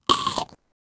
eating burping; belch

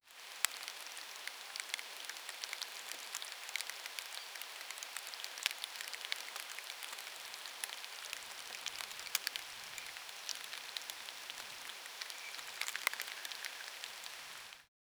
forest

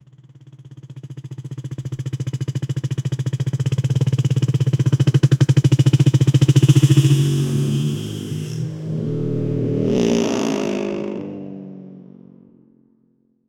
motorcycle moving fast

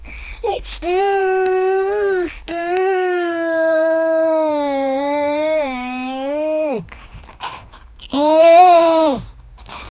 the young boy is happy

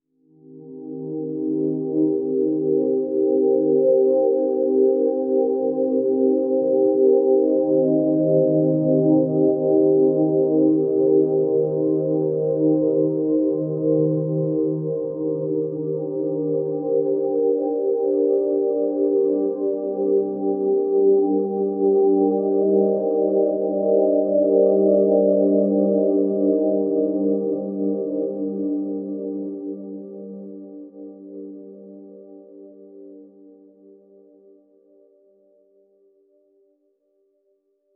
the extremely relaxing so, keyboard

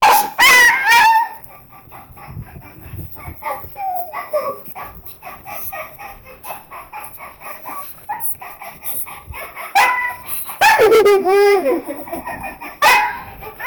a 24 year old manplaying, pet

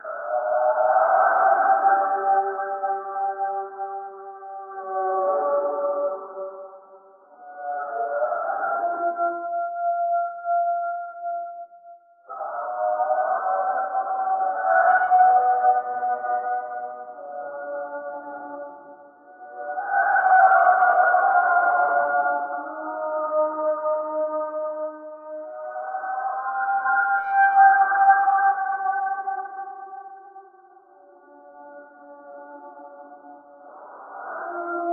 the angels cry, angel's cry

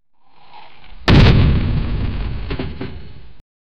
accident

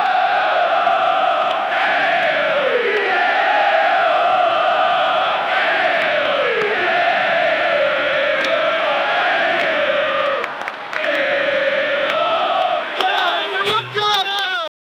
football ground song and, chant

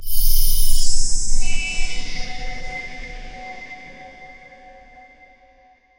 power up magic soft magic; mana

